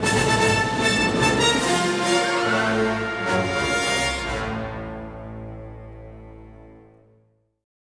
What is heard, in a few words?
battle win, music